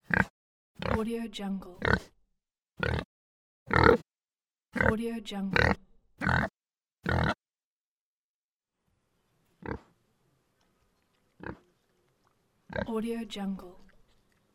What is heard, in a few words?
pig grunts